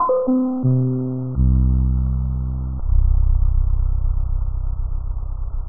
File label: arpeggio off key; music mouse demo